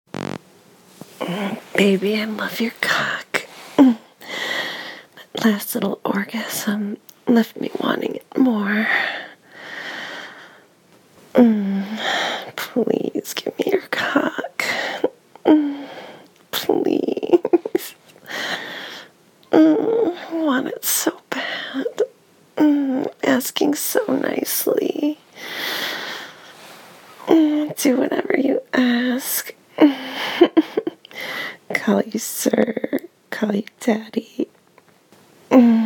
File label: Begging for it, Erotic audio